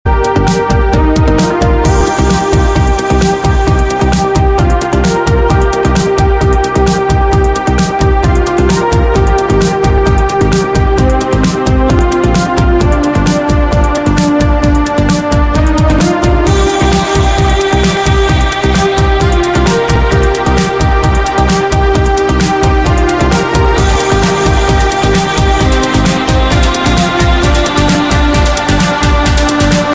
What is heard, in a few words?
Inst